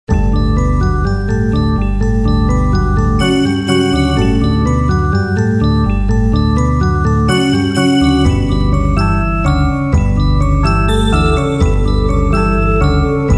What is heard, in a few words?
walking music